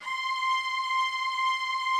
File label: Instrument